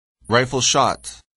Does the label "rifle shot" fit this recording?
Yes